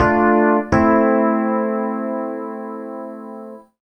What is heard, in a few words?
DEEPHOUSE ORGAN 125 A